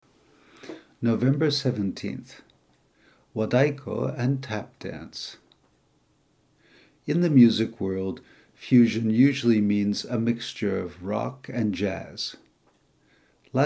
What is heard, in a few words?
wadaiko Tap; Waidaiko and Tap Dance; Jaremaga 10th Anniversary Edition Winter